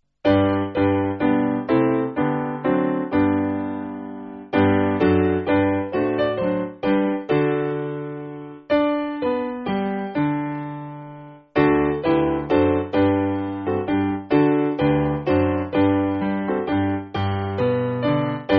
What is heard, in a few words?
Italian Hymn, Piano